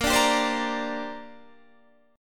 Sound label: Bb 6th Add 9th Bb Guitar Open D